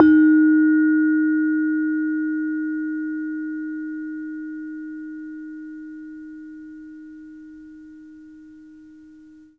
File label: SARON D#2; Spectrasonics; Heart of Asia